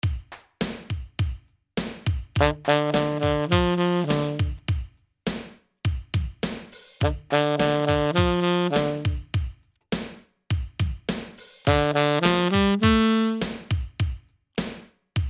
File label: Tenor Sax